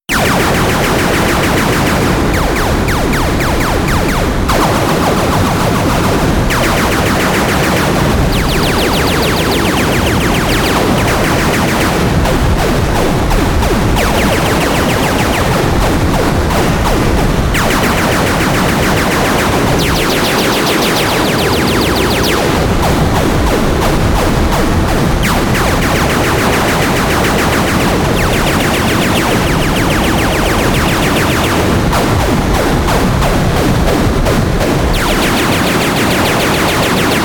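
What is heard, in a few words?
Space battle With Laser weapons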